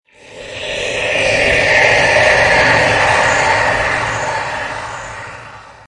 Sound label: Wind; Halloween